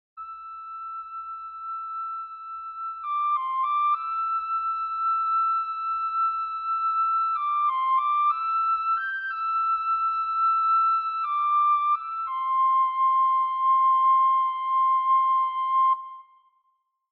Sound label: Mexican Piccoflute